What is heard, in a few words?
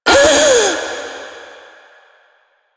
Ring Fail; Warframe; Jade Shadows; Quests; Birth Minigame